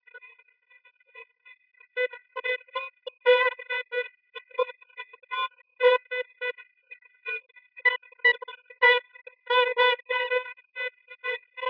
Jazz

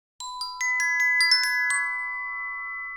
glock

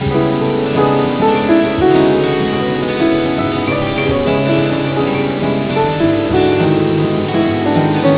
music mix

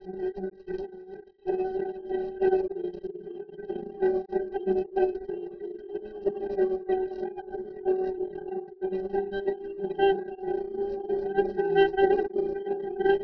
Jazz

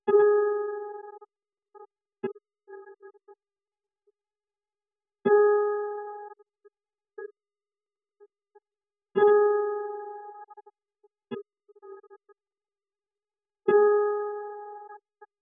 piano 2 layers

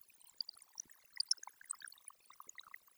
denoising glock 0dB mmse

